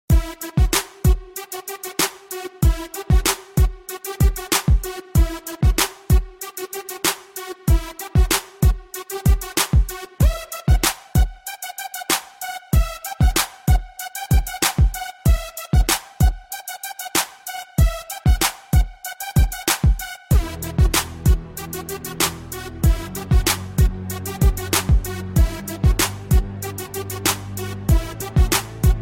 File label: Crunk Ringtone